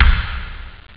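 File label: bass drum; Amiga Soundtracker sample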